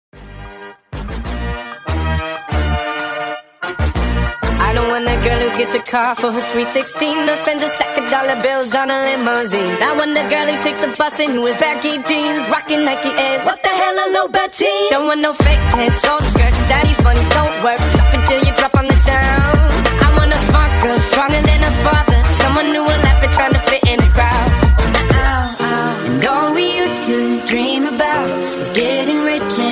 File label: Night core